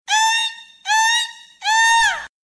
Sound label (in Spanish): Grito Guanacasteco